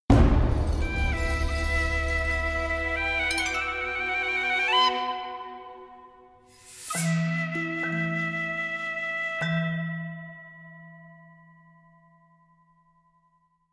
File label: Trans Start Music Stem